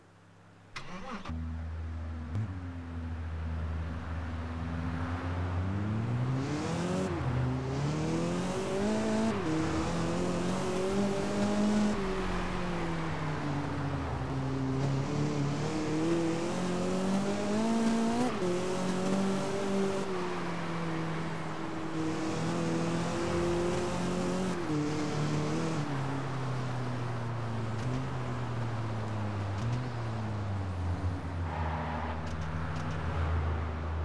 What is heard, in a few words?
mercedes-benz clk gtr; TDU-1